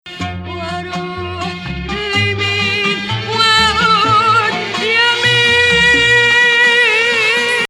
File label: 2nd Rast, maqam